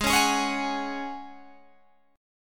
Db Suspended 4th Ab

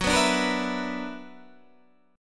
B-Minor Major 11th

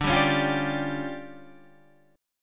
D Minor Major 7th Double Flat 5th D Guitar DADGAD

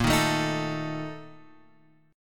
Bb Major 7th Suspended 4th, chord

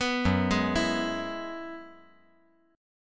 F sharp 7th Suspended 2nd Suspended 4th

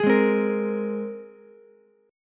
Ab Major Add 9th, Ukulele; strummed; 13,8,8,13

